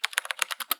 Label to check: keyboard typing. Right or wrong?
Right